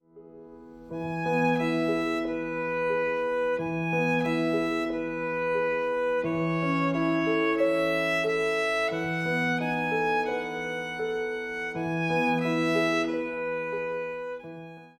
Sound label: VIOLIN